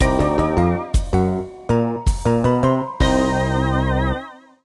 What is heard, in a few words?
Win, Clearing a level; Clattering Cabin